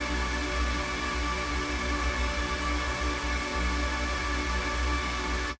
Giant Saws, pad

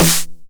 909 SD Tape Sat

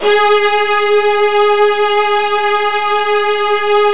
Virtuoso Strings

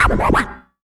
Yamaha CS1x Drums

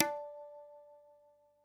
DJEMBE, Yamaha DD-65; PERC

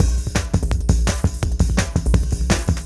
CYCLONE KIT, PROCESSED ACOUSTIC DRUM KIT; Sonic Foundry (Sony Creative Software); Vir Unis Infusion

